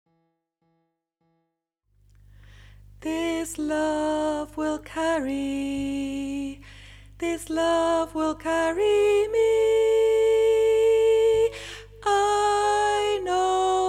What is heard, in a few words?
alto